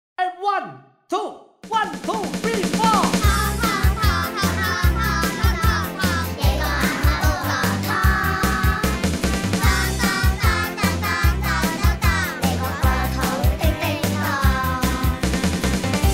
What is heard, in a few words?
kids